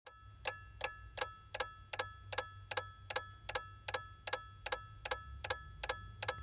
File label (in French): Voiture